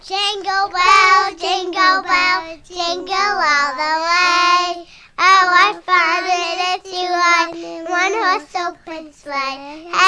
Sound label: Jingle Bells